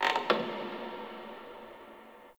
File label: Wooden Creak Small